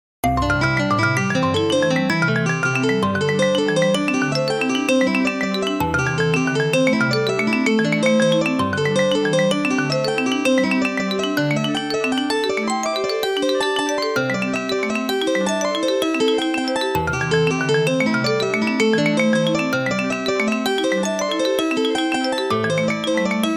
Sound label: polyrhythm; Navigating the pacific by stars, wind and waves